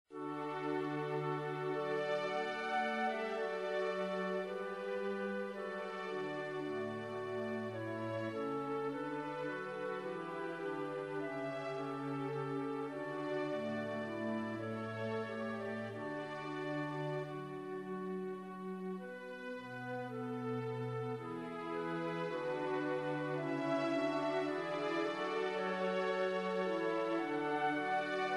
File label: instrumental